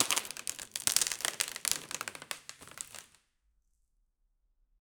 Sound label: a piece of plastic board, man made